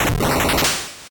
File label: 8bit se